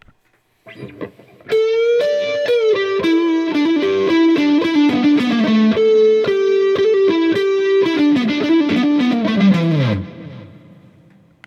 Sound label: Guild NS Bluesbird Neck Backline, guitar; 2016 guild newark street bluesbird guitar